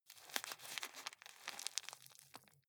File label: juggle squeeze